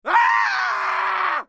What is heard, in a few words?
scream terror